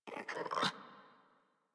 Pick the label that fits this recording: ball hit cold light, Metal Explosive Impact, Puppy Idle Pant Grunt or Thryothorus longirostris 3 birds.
Puppy Idle Pant Grunt